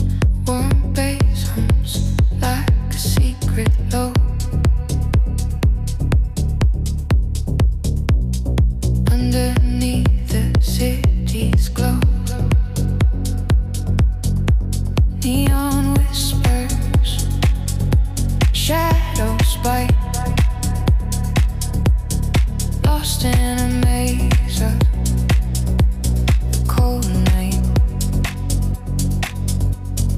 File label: warm bass cold night